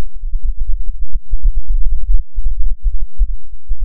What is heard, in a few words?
Wobble; Ableton Live 10 Suite